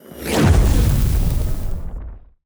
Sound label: FireBall Start; Fire Elemental; TANK; 3Souls